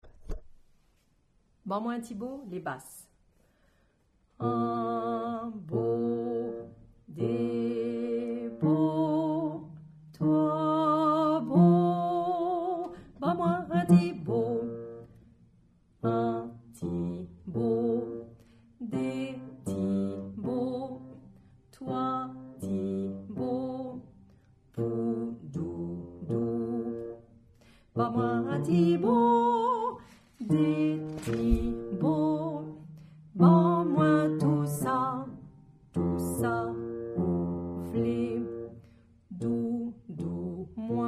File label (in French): Basse